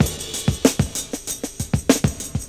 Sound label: PERCS, 70 s Breakbeats